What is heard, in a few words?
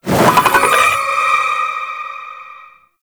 Projectile Charge; Enemies; Abilities; Warframe; Sentient; Brachiolyst